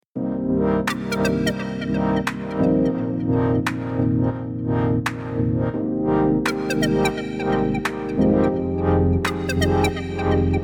vlog music beat trailer showreel promo background intro theme